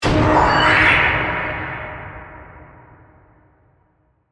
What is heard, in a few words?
Hit To Whoosh; Hit Sound Effects